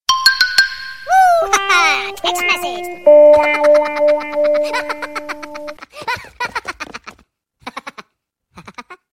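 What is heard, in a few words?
sms minions funny sms melody; sms minony zabavnaya melodiya na sms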